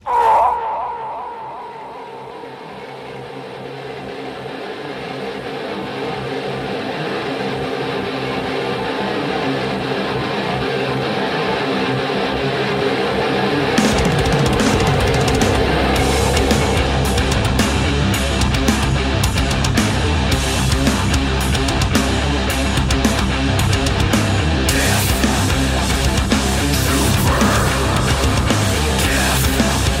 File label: Metal; Death Trooper